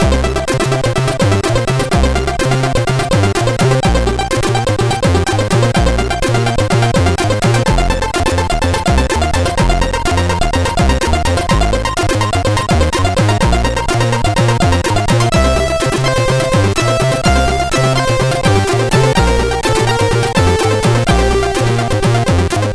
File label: Introtune, sid CSG8580R5